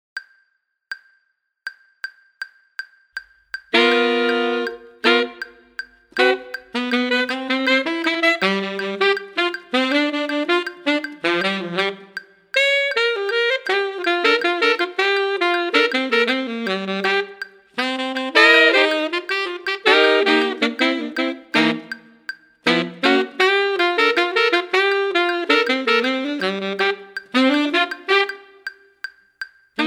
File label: playalong bari